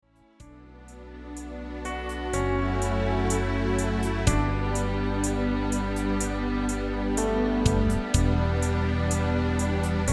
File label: Instrumental